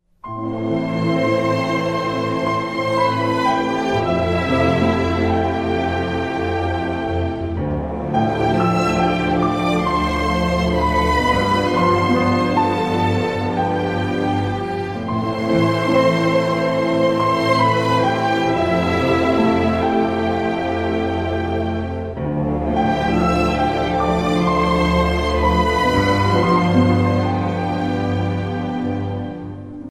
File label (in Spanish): musica clasica